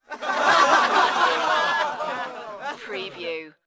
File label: Crowd Laugh